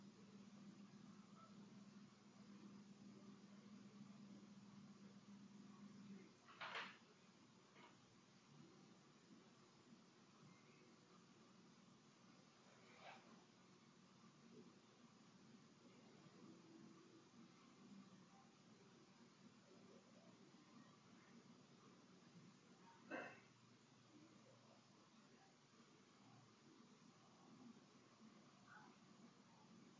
Empty Room; historic rainworth lodge investigation

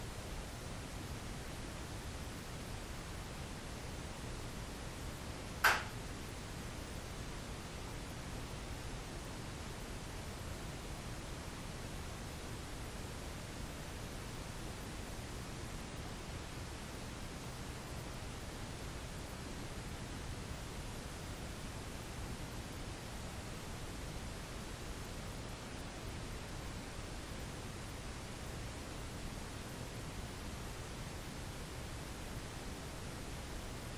Tattoo Shop Static

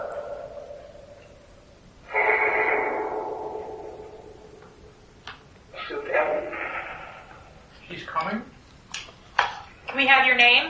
Phasma Box; 2nd Fl Eva Room; gill house investigation

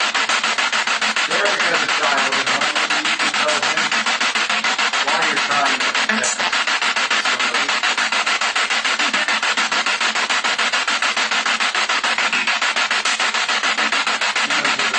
Kitchen Spirit Box; private residence freedom road apartment investigation

